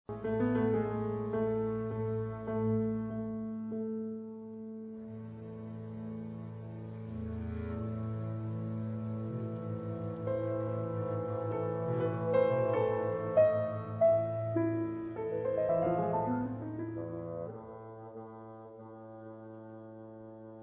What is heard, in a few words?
piano concerto; guernica